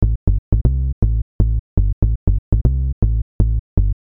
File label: Fat Bass